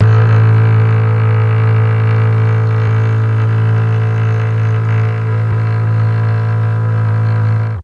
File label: A1 mellotron cello